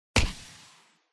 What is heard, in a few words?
Heel Click; fortnite emotes